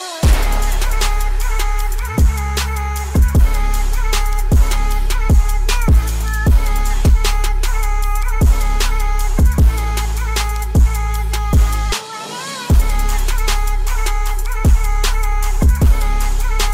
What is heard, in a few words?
Arabic Bass